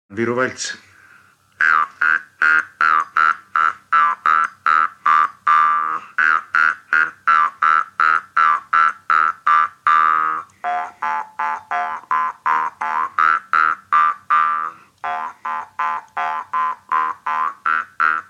valts parmupill folk; Viru-Nigula

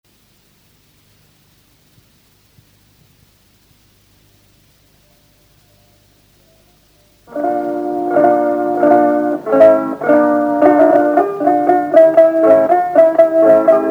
polka, kannel, folk; Ranna polka; Kirbla

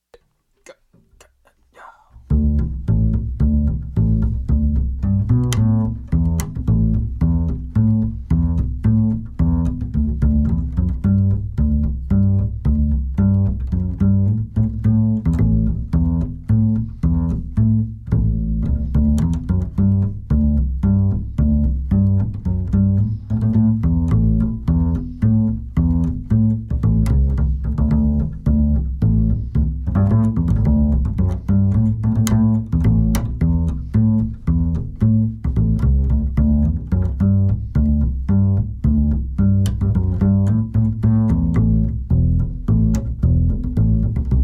Paaveljoni Polka aeglane; folk; Helme; Marti Tarn

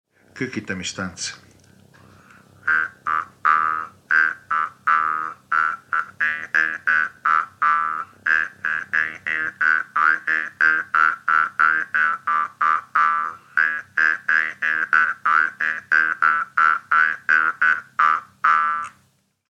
Kukitamistants Viru-Nigula parmupill folk

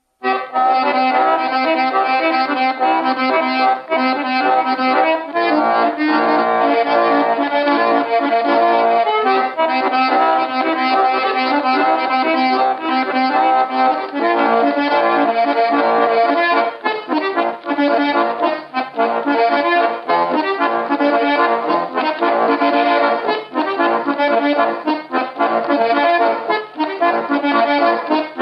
torupillivalts; Orja torupillivalts; Vandra; loots; folk

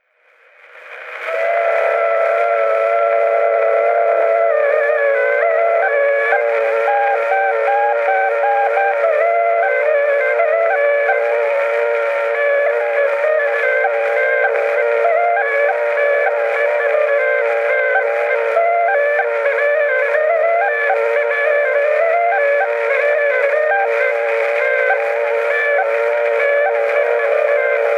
Torupilli lugu folk; Emmaste; Torupilli Jussi 34 lugu; Juhan Maaker

